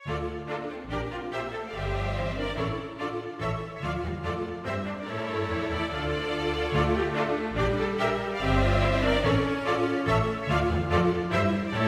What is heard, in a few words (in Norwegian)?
Air for strykekvintett; Hertz